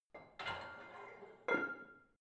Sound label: barbell plate slide in hit ring soft, Fitnessstudio; sport freizeit